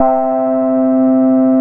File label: DREITON C4, SYNTHS; SE-1